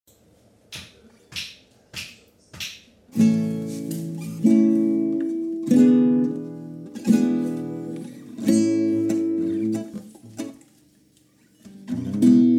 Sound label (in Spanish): Guitarra